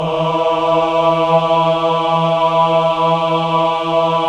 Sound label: CHO AH, Choir of Ahs; Roland - LA Composer vol. 1